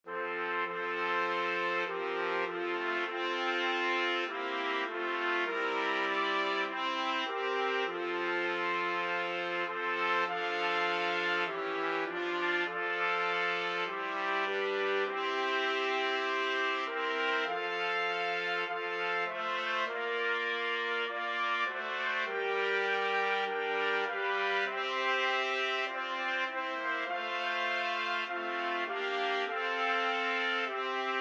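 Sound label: 4 trumpets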